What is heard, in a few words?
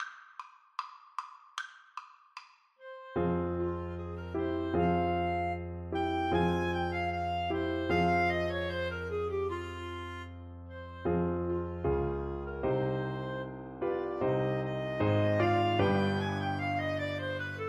Clarinet duet